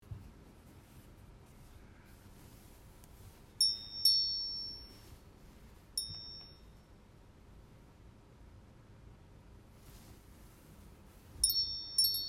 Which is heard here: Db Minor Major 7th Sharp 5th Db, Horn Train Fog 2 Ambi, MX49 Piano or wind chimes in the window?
wind chimes in the window